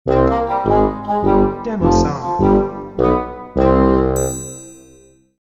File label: Oboe Tuba